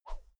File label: whoosh thin slow soft; swooshes-swishes